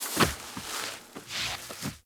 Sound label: Kubrow Foley Interact Sleep Roll Over; Enemies; Warframe